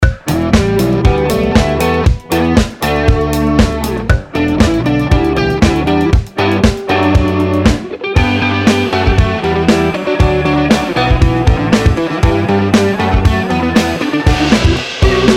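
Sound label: Theme With Reverb Tail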